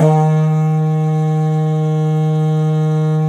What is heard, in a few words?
EF2 HORN, Didgeridoo; Big Fish Audio; DIDGEHORN1